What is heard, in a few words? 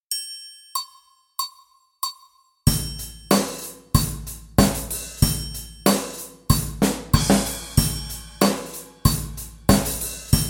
2 beat